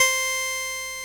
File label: ORG VOX C, Continental; Roland LCDP09 Keys of the 60s and 70s 1